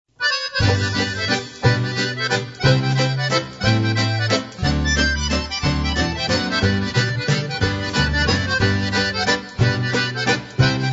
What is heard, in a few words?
TOY ACCORDIAN, polka